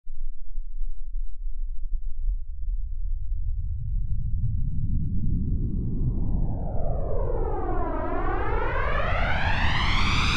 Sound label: Pink Noise Effix Delayed; Techno